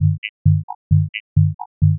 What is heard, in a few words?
drums osc